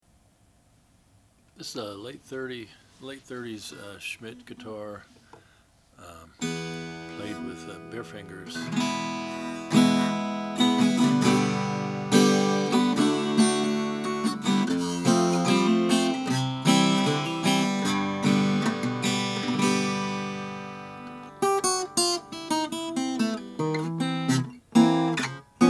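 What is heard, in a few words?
oscar schmidt decalcomania sunburst, Guitars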